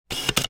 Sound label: CAMERA SLR